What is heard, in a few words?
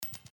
Coin; The Adventures of Puss In Boots - Catch The Thief; NextlevelGames